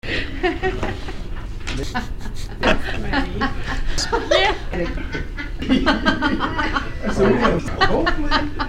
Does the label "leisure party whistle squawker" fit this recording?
No